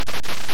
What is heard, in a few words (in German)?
zündschnur